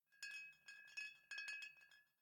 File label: Bottle Shake; The Town